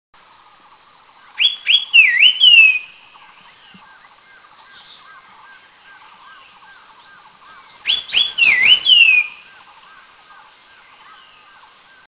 Rufous-backed Sibia; Eaglenest; India

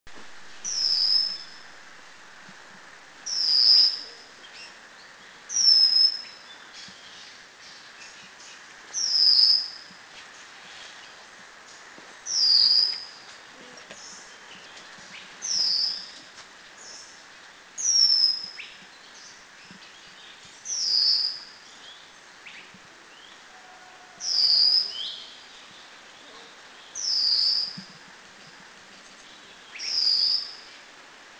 White-crowned Forktail, Thailand

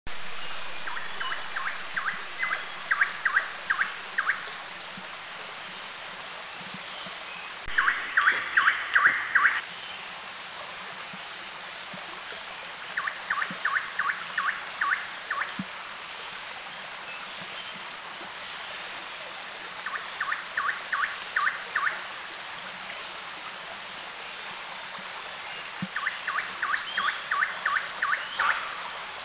Greater Racket-tailed Drongo, Thailand

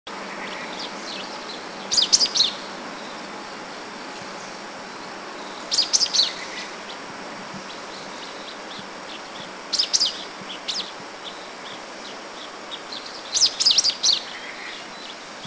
Black Bulbul

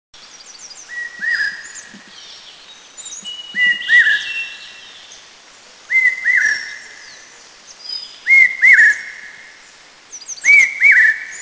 Large Hawk Cuckoo; Eaglenest; India

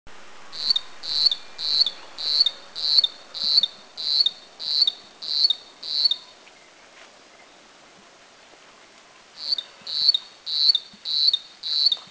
Russet Bush Warbler, Dirang, India

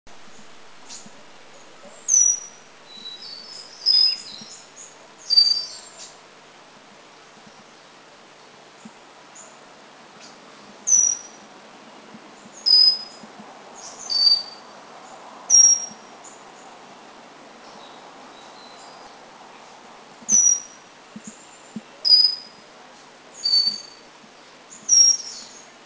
Pygmy Wren Babbler, Doi Inthanon; Thailand

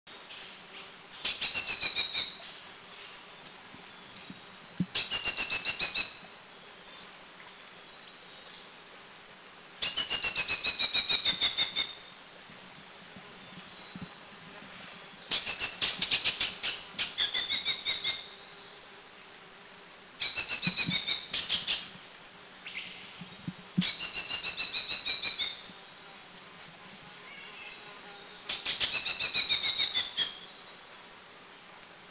Hill Blue Flycatcher Calls, Thailand

